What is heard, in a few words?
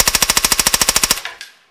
silencer burst; Weapons